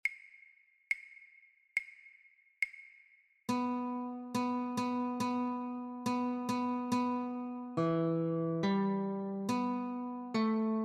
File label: Notes, guitar